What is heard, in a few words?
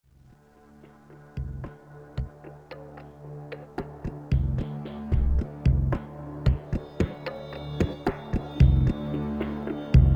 Level music; Sniper: Ghost Warrior